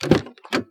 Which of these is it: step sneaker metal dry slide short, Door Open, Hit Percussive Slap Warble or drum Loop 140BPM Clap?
Door Open